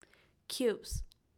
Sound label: EDUCATIONAL TOYS Cubes